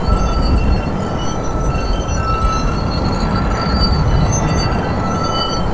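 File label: frost travel loop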